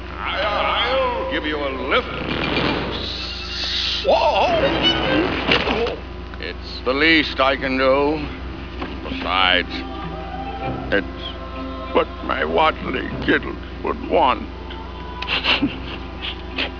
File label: LIFT